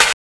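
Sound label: CHOPPER SNARE; Drums In Your FACE!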